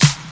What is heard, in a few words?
good snare one shot E key